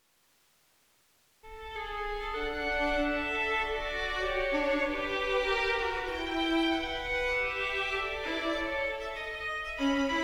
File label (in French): pour deux violons; Nr 16 Le Desir de Beethoven